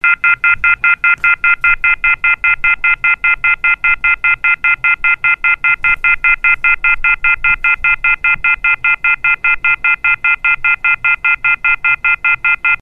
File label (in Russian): zvuk sniatoi trubki v telefone posle ubiistva